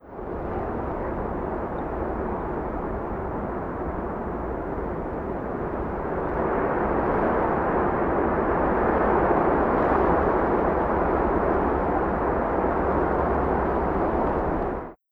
Meteor Wind

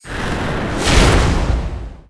Meteor Fall; disney star smash android ios mobile gamerip 2020

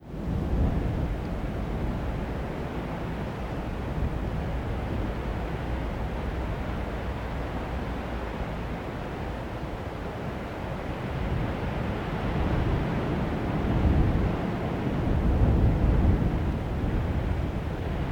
Namibia Wind

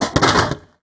Vending Machine fall; d4dj groovy mix; android

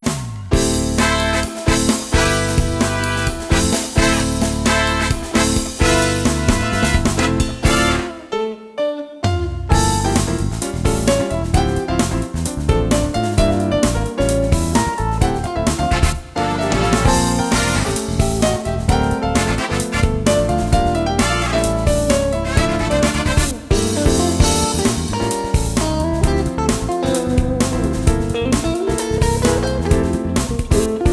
Clock Tower Stage; marvel vs. capcom 2 new age of heroes naomi 2000 dreamcast gamerip

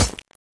Impact Flesh; Going Under

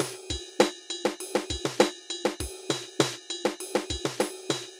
Cool Drum Beat; MirrorFall

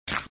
bloodshoot

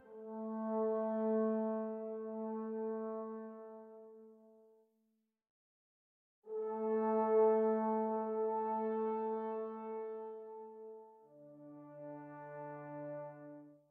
brass

